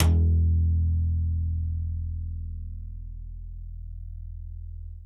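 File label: acoustic bass slap E1, right; Masterbits CLIMAX 7 - Bass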